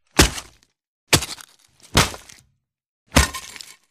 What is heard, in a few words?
Tomahawk Impacts